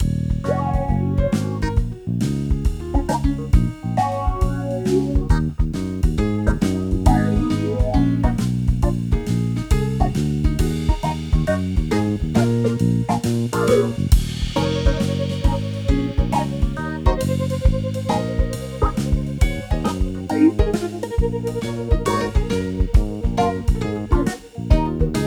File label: elevator ride jazz